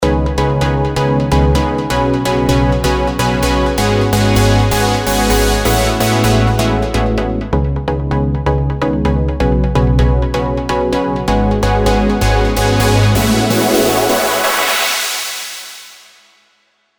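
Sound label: Spire, pluck; Alpha 8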